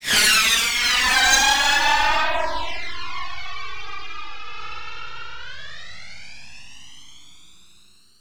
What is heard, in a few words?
Rocket, Flyers; Zero-G Seismic Frequencies